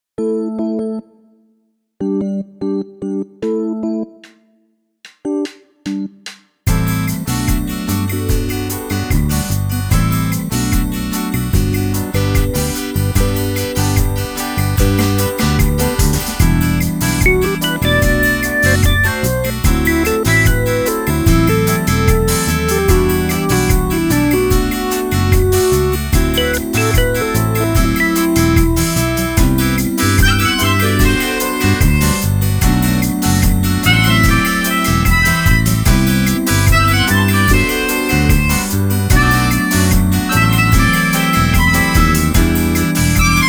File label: Dirty Winds Muzak